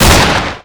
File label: thompson fire